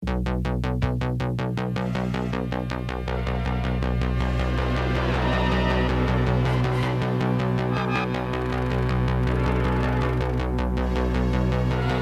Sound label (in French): accords